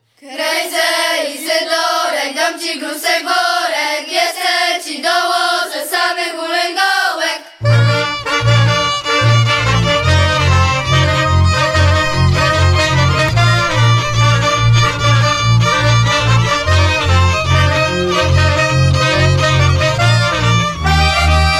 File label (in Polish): Polka z przytupem